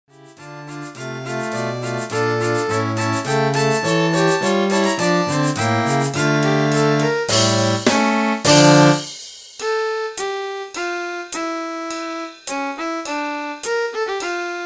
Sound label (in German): Quintett